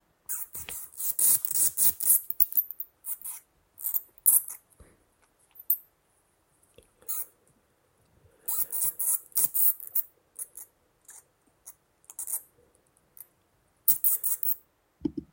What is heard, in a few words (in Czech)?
Netopýr dlouhouchý; netopyra dlouhoucheho